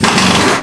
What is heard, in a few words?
Fans normal; AOS RF1 Kart Demo